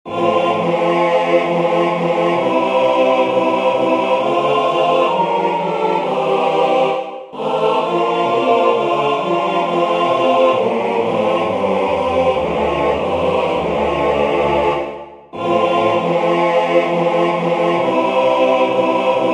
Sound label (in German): Hymne Gesang; Lionsclub Mattsee-Trumerseen